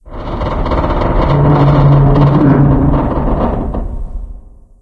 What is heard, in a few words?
fire groan; random amb sfx